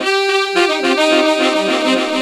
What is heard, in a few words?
Sax Section Effect, effected sax section; Sonic Foundry (Sony Creative Software); Jazz Solos and Sections